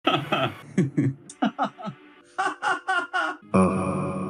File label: cracking up; cracking tf up